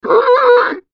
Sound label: Monster Pig Bleat Moan Creature; Animal; Pigs; Blastwave